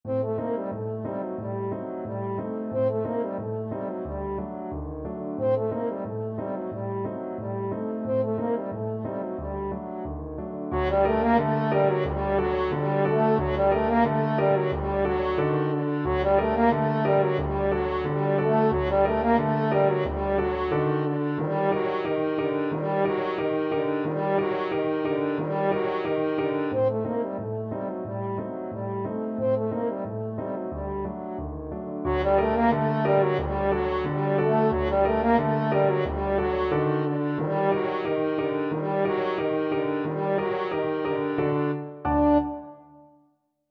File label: Abdala, french horn